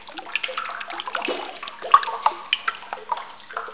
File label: mr drip